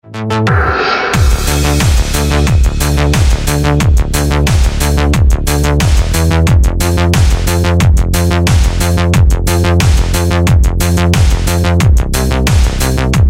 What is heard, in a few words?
Remix Trance Technology